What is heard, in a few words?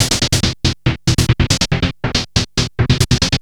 Treat Guit, Industrial Nasty